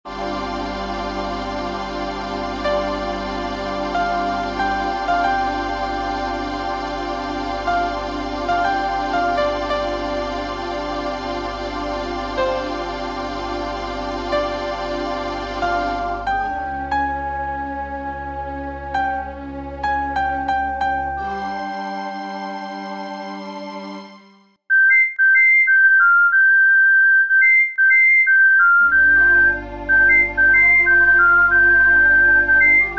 Piano

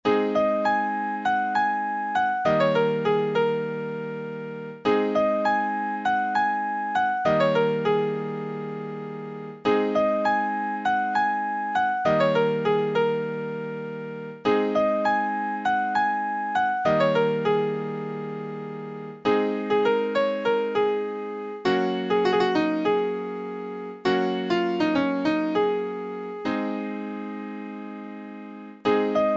Piano

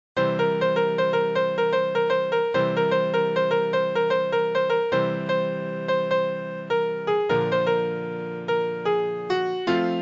Piano

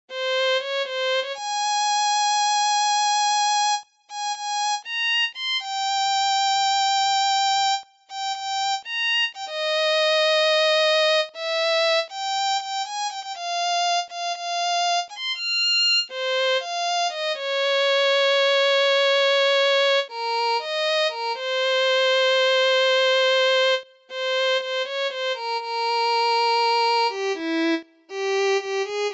Violin

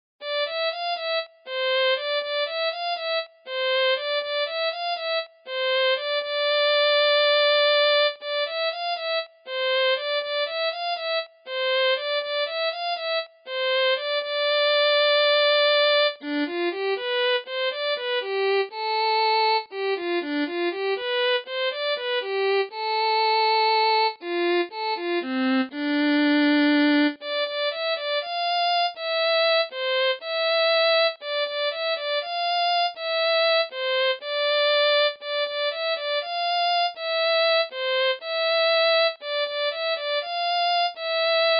Violin

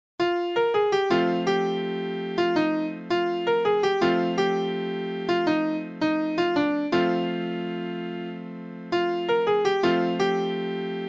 Piano

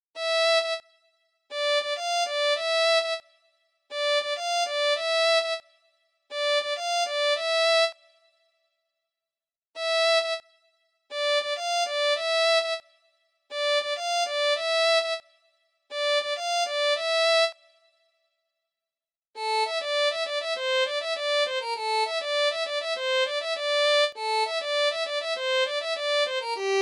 Violin

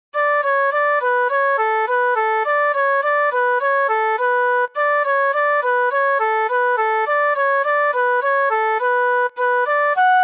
Flute

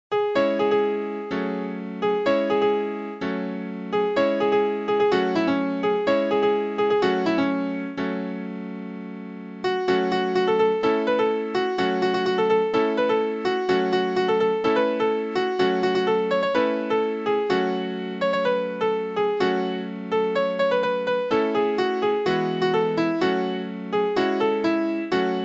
Piano

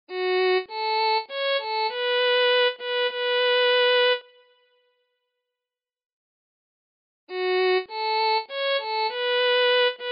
Violin

